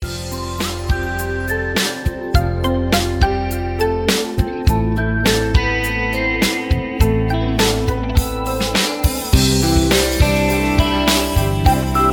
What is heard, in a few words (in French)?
chanson ringtone